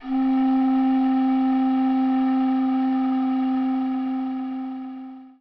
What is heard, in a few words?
c2, Pan Flute, EMU E-III; ProSamples vol.55 - Retro Sampler